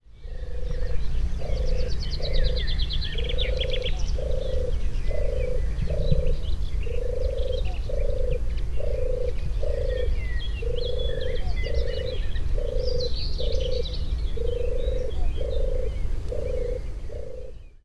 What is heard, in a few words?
dove; birds in the bible